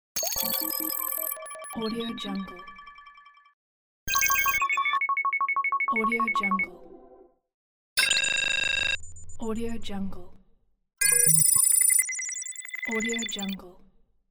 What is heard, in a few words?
satellite operational sound; game sounds; عملیاتی ماهواره